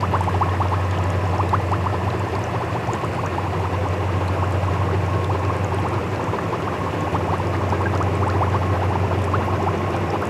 Scuba Sub Running Loop; ocean-commotion

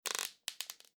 floor creak; time-lab

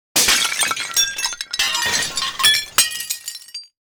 dishes crashing small; mystery-island

